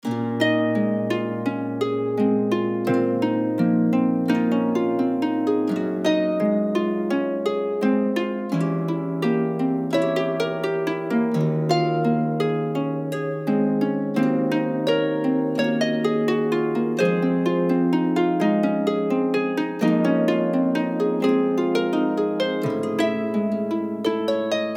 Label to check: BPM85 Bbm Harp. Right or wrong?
Right